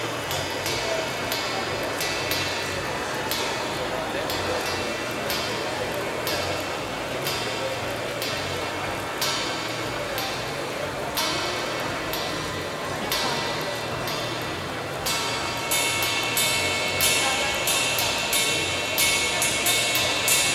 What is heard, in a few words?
kinetic lego cymbal sculpture